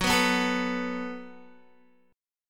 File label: F sharp Minor Double Flat 5th F sharp down Guitar; strummed